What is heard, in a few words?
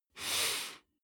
nose sniff male slow; koerpergeraeusche; menschen; soundpool